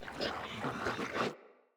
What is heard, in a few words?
Corrupted Battle Dog Idle Breath Pant, Animals, Vocals